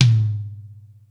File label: More Toms, Drum Machines